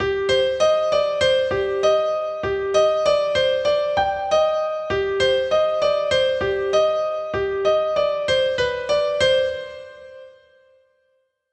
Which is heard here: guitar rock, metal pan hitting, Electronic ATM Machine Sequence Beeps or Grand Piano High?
Grand Piano High